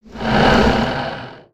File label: Spawn Whoosh; creatures organic